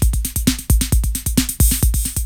RHYTHM BOX, BREAKBEATS; Crypton - DrumBase5000

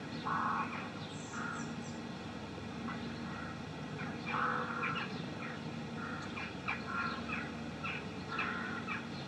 Ambience Loop, outdoor

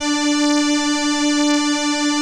ELKA, String Machines

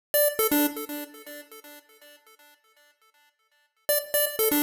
Mono Synth, 120bpm D; Zero-G; Nu Disco; Happy People

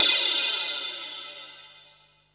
hat, Techno 138 BPM

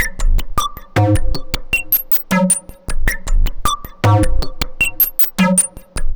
Modular Loop, Modular Percussion

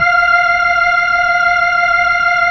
B-3, Full Xfade

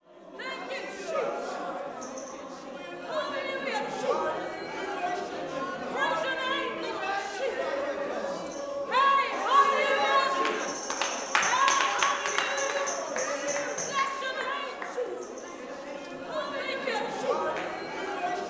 WORSHIP, Gospel; Spectrasonics; Vocal Planet; WORSHIP AMB

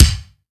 Bd Agressive, Kick Drums; Drum Hits; Nu Dance Electro; Zero-G; Soundclash

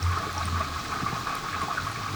Waterway A Dark

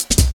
Hard Stepz B Cut, Drum Loops 166.5; Zero-G Jungle Warfare 1, 2 & 3

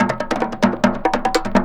LOOP PERC, 145 BPM; Ueberschall; Drum & Bass Resonance

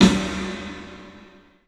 PA DEEP L, CLUB SN VRB; 3D Sound; 3D Drums

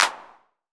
PERC 909; Electronic; Wizoo - Platinum 24 Electronic

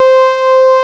WAV SWEEP C5; Big Fish Audio; Synth City

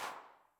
HAND CLAP, 909 Reverb Pitch

